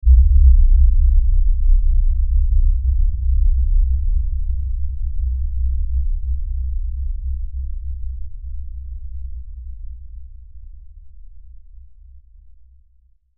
Lfe Hit Long Pulse Rumble; Production Element; Sound Design

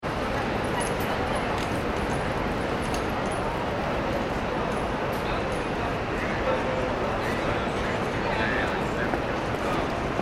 ambience urban city nyc wall street ext; New York City Street Ambience ( Wall Street ) People Walk And Talk With Traffic And Genera; McKinney Sound

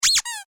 Toy Squeaky Squeak Short; Miscellaneous Toy; Strange Toys

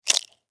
Insect, Claw, Pinch, Snap, Movement, Bug; Animals:Insects; Insect Claws; Blastwave

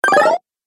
Retro Videogame Fx Magic Beeps; Video Game Sound Effects

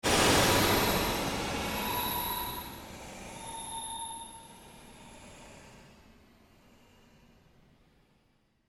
Created Surge Fade, Rise And Dive Sound Effects; Sound effects created by manipulating other sounds; Rises and Dives

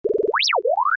Computer Beep: Odd Effect; Communications; Communication Sound Effects; Communications (Sound Effects:Science Fiction); Stuart Duffield

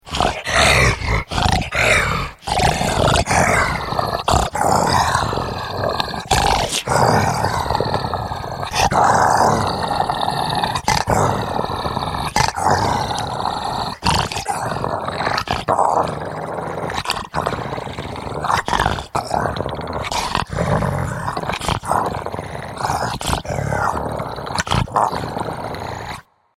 Snarling Beast, Snarling creature; Miscellaneous Animal; Animals

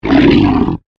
Monster Growl, Horror, Monster, Growl, Creature; Monsters; BlastWave

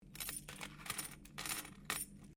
Coin Penny Multiple Move Laminate; Common coins; Money; Objects

